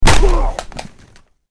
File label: hit; csgo